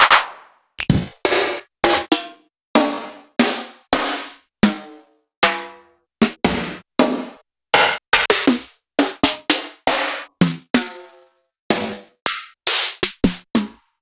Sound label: Roland R-70 SD